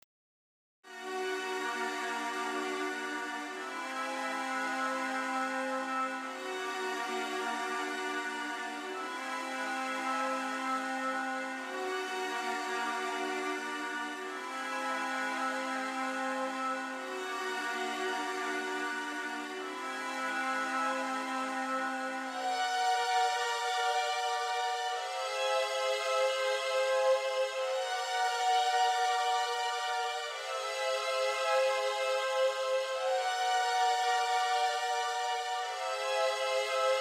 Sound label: Mystic music